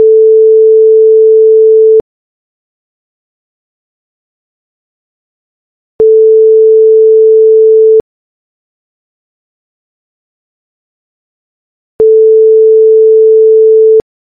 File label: Phone ringing